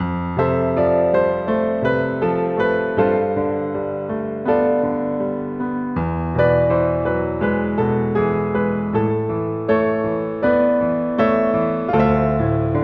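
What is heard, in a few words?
background music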